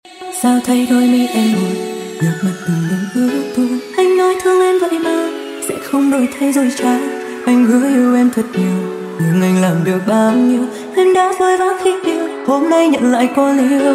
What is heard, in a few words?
remix